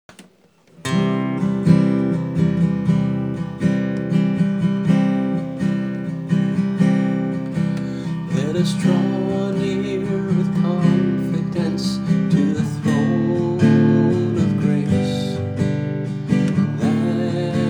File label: guitar